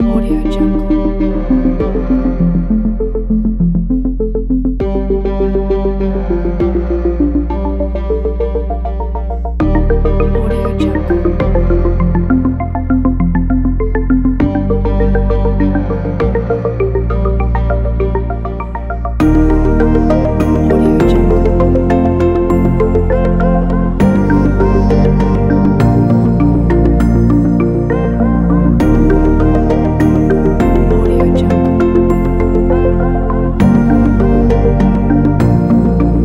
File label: experimental ambient